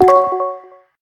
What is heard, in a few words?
Cell; LG; P940-Prada-3.0